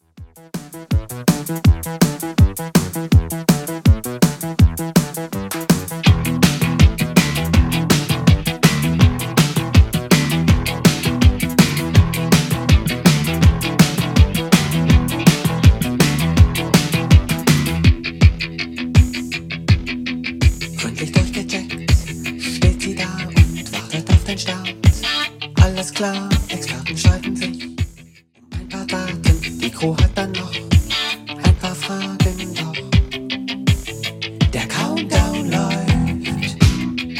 80s Redrum 163bpm

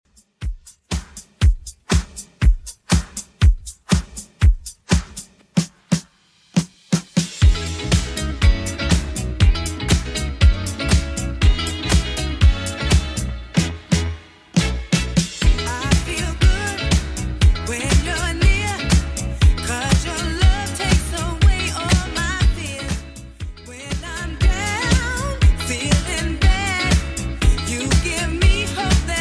80s Redrum 120bpm; remixplanet

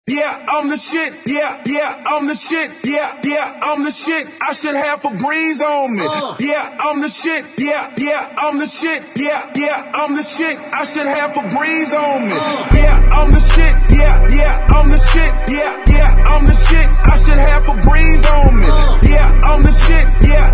Acap In

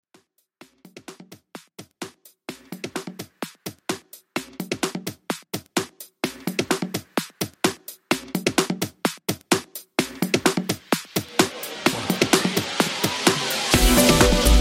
House Club Mix 128Bpm

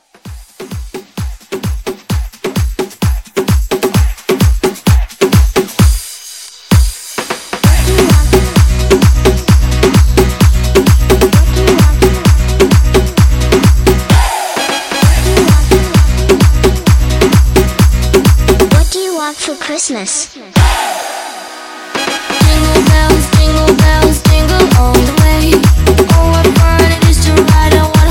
Xmas Club Edit 130; remixplanet

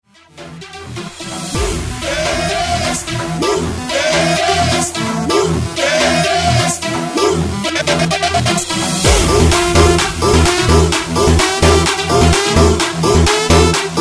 128 BREAKMIX

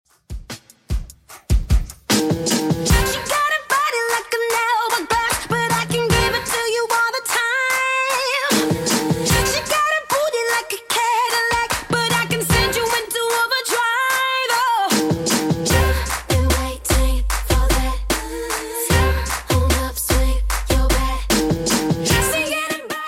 2ks Redrum 150bpm

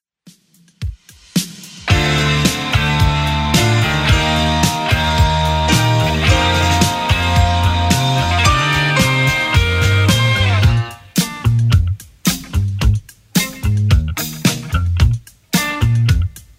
70s Redrum 110bpm

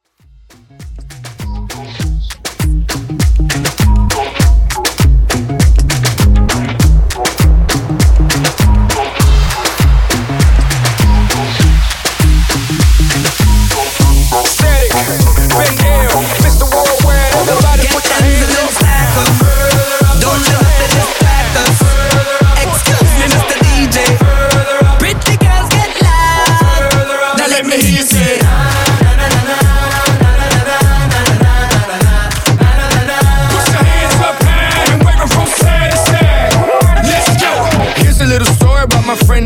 Intro 100Bpm

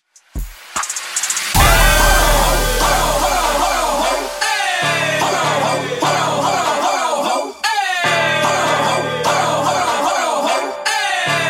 Funk Intro Outro 75 Bpm

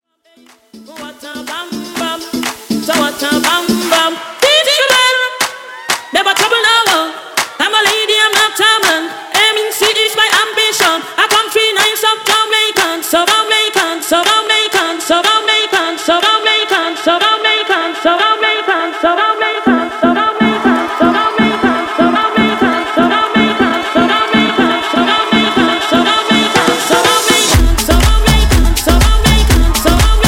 Party Edit 122Bpm

